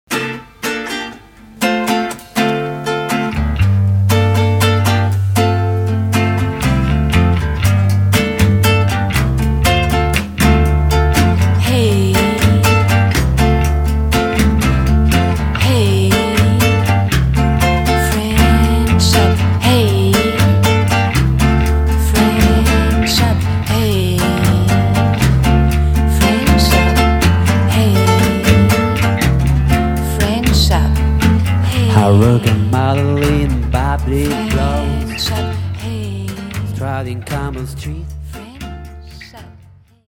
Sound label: French Up Song